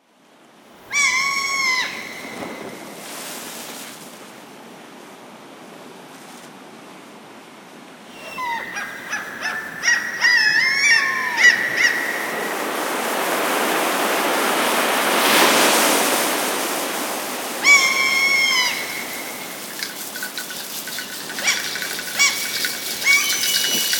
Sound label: Seagulls and Shells; Lumia 1520 South Africa